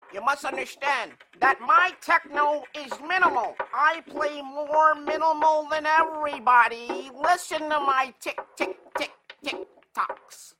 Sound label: Minimal